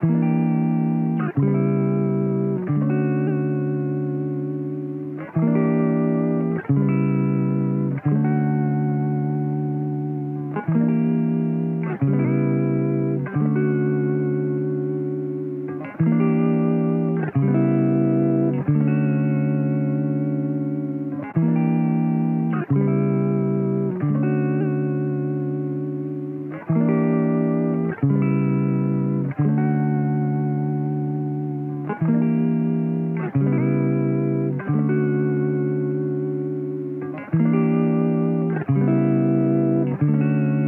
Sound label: Electric Guitar 90 BPM C# Maj, Dry; Melodics; Cymatics; Eternity Sample Pack; Guitars - Electric